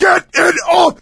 scream while pounced, coach; voice; source engine; left 4 dead 2; survivor

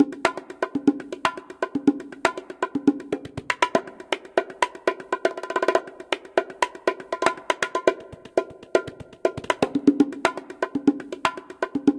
taunt bongos; music; source engine; tf; video games

